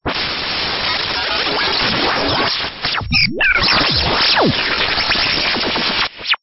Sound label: Stinger; STINGERS